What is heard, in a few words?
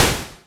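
Boss DR-202 percussion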